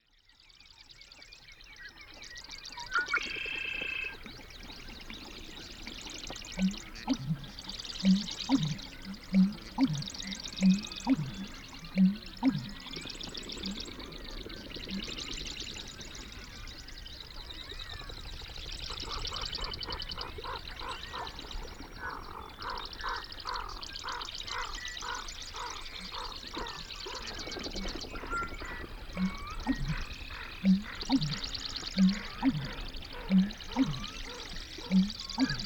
Prairie Marsh Night Into Day

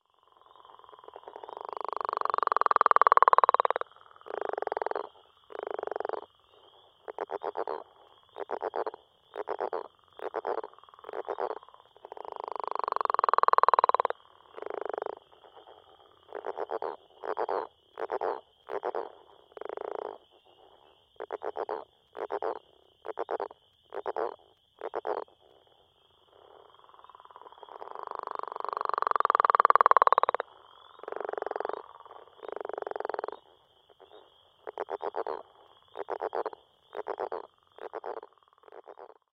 rana pipiens

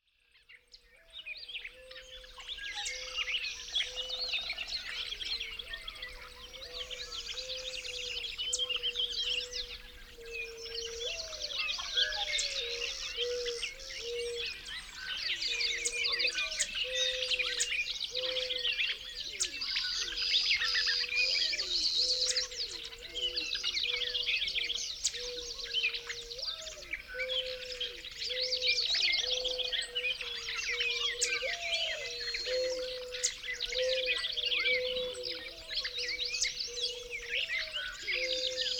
Abundant Life Birds at Dawn

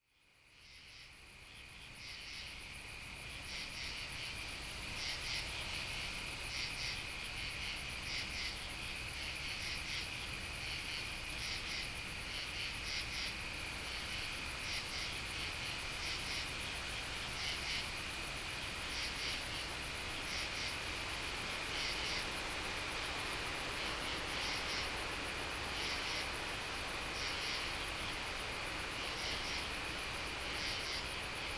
Insects Wind Insect Lullabies

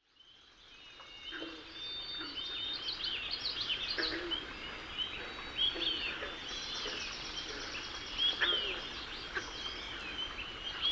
Dead Tree Pond Night Into Day

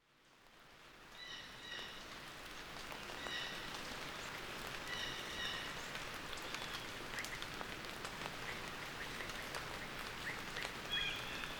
A Sprinkling of Jays Rain Moods

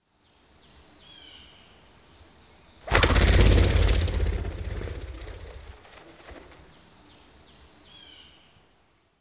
ruffed grouse flight

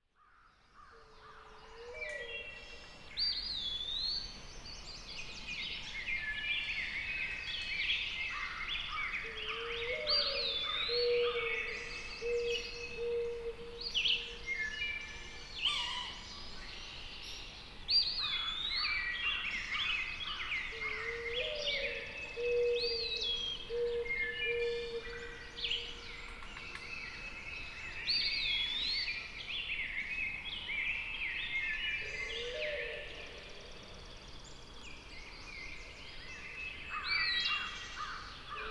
Woodland Medley Birds at Dawn

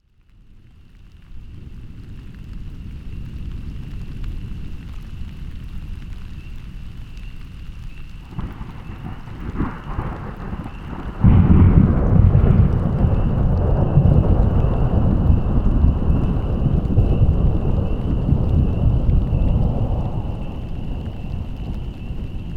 Rolling Thunder; Elementals

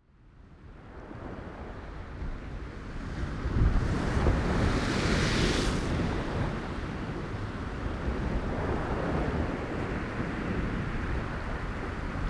Island Wavescape; Elementals

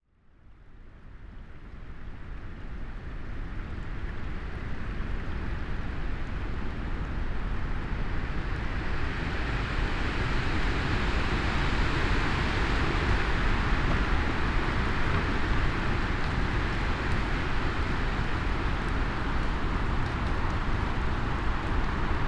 Winter Windstorm Wind Songs

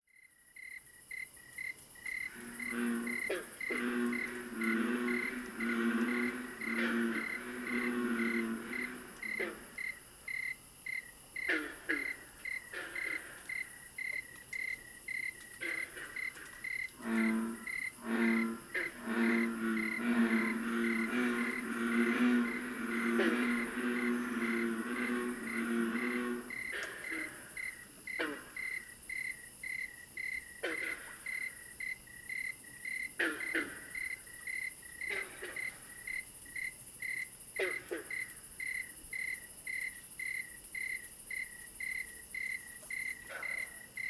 tree cricket with bullfrogs

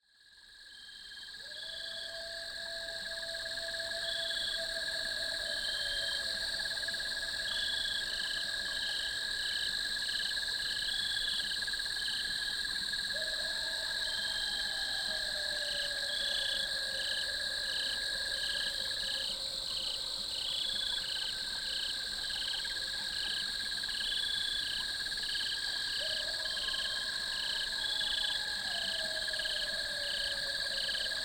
Kentucky Lullaby Insect Lullabies

